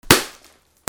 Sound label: CARTOON